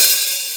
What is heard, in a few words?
present open hat sound d key